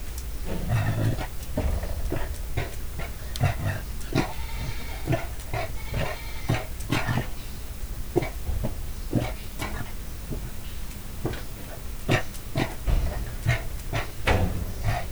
sivatagi hiúz lesfelmászkálva morog; állathangok; miskolci zoo 2009

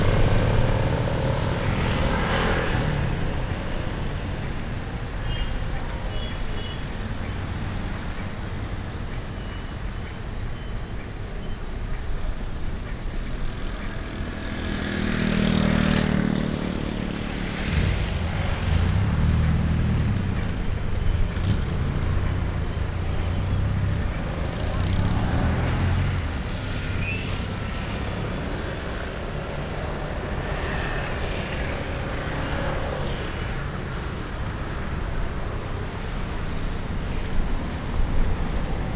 motorok dudalo auto

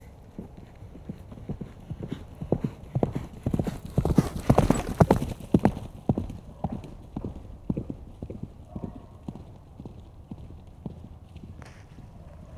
sieto lovas jobbrol, lo; állathangok; paraszt udvar

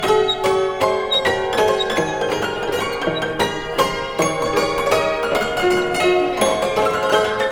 marionettmuzeum nyulfarknyi verkli

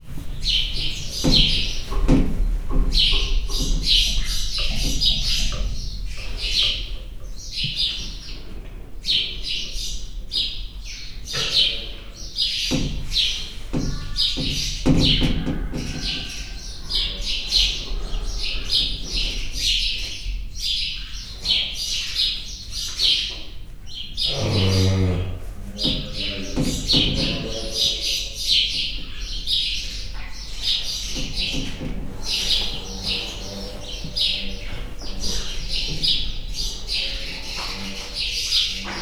istállóban háttérben rózsás gödény, házi veréb; Hortobágyi Nemzeti Park 2013

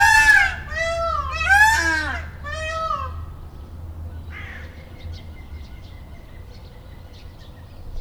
kettőt kiált, páva; debreceni zoo 2012; marantz puska

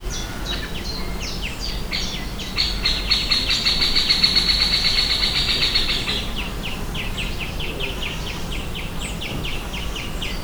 riasztóhang, középfakopáncs; termeszetben

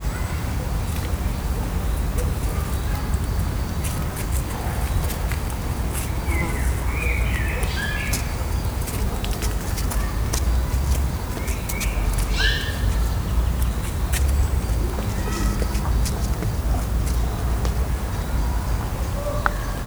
állatok neszezése, törpe víziló; szegedi zoo 2011

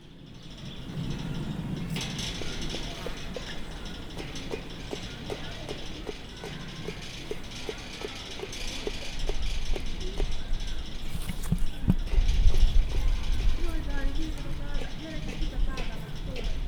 kerítést csőrével csipkedi, emu; felsőlajos zoo 2015; cardioid

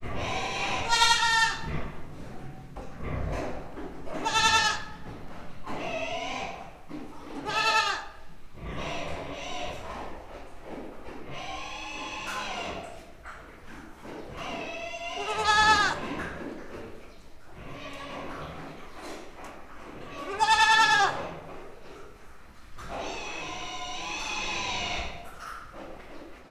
kecske az enni akaró mangalica koca visít, házi kecske; miskolci zoo 2009

